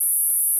Gauss Filt E4

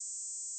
Gauss Filt F5

